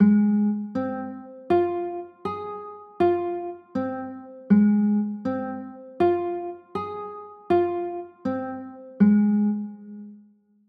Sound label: a flat 6